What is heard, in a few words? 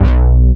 Long, One Shot Bass